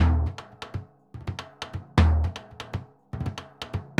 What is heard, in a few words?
Bombo Bomba 120, Drums & Percussion, 120 bpm; Best Service; Festa Latina VOL-1